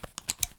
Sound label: item medkit open; salewa; Escape from Tarkov